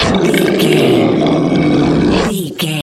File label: troll monster effort struggle